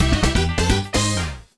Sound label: Jingle Vs Clear; Super Mario 64 DS; NDS